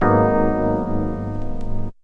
sharp rhodes seventh; Pads

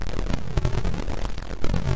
old videogame noise; LOOPING AMBIENCE

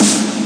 melbourne bounce snare big; STYLE KITS (Hip Hop, Etc); D3ron Melbourne Bounce Kit

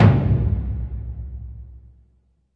big burundi, African Burundi Drums

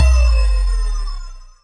Electro FX; Ueb HE Electro FX

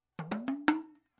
tdrum, Drums

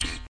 jawharp E2; WORLD

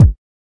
kick

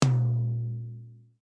Heavy Tom, DrumKit; REAL LIVE KITS; Osama Qureshi DrumKit

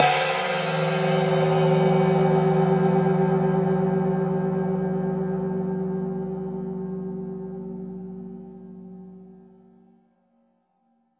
thin gong; Gongs and Super Crashes; single hits

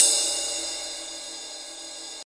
CYM RIDE, DRUMS SINGLE HITS

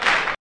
house Clap; House Kit; STYLE KITS (Hip Hop, Etc); Choosy House Kit

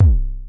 kick, drums single hits; iElectribe Kicks

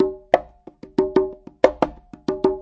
091 bongos, Bongos; DRUM LOOPS and BREAKS; Bazillions of Bongos 090 to 131 bpm

